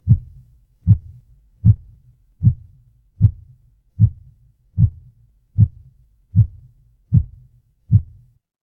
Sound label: horror heartbeat